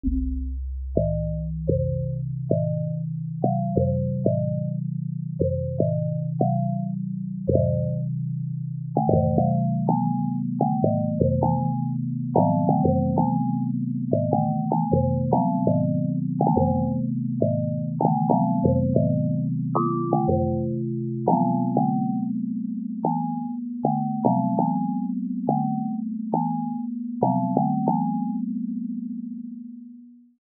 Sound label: gong; graz